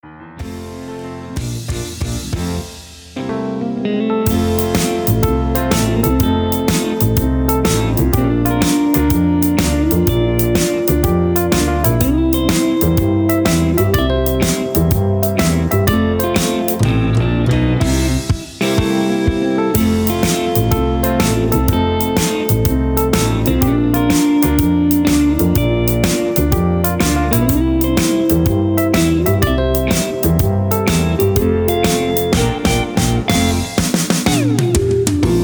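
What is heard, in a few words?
Instrumental with Melody with background vocals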